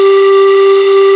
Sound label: PIPE G3; AKAI S-Series CD-ROM Sound Library VOL-8